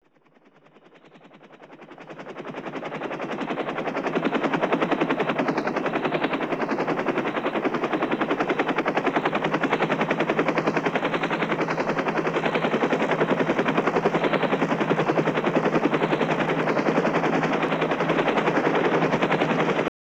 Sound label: Helicopter Fly By